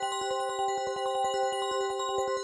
Base Tally Loop; playboy Gold; Mahi Gaming

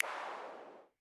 spin start; riviera Star Desktop; Fantasma

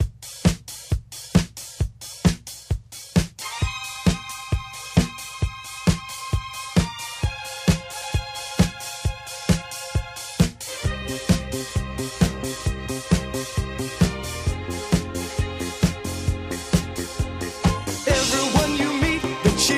Music Free Spins Loop

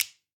slider switch; astro Legends Lyra and Erion Desktop; Foxium

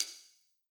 bet click; Skillzgaming; gems odyssey

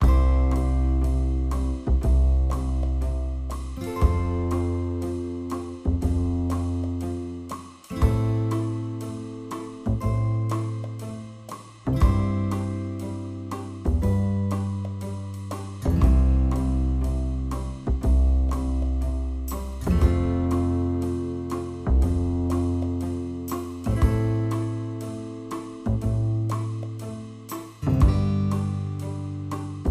music; Skillzgaming; fruit Blast; matrix

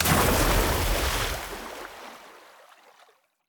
FightSequence Water; Wu Xing; genesis

